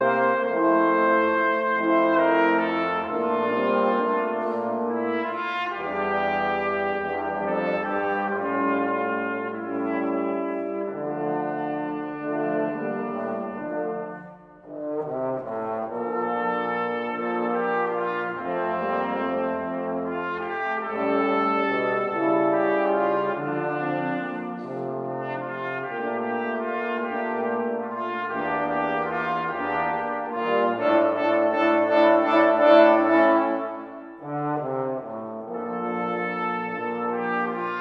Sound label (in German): Meditation